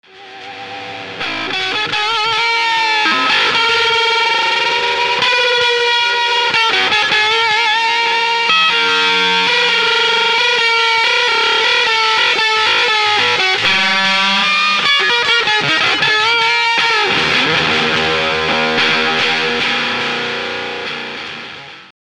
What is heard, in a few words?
IBANEZ OCTAVE OT-10